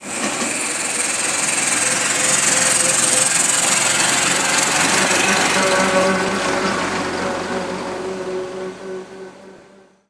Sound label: leyland n type; Simutrans; Pak128.Britain-Ex